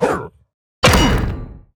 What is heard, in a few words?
smash; hero; zhu